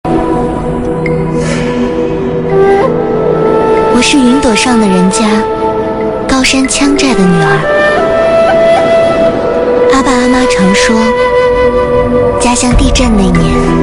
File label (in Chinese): 央视公益MV; 赵文卓